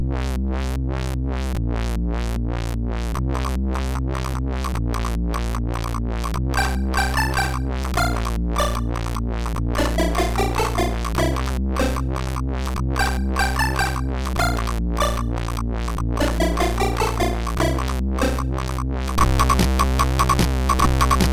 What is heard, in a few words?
Endsound, MOS6581R4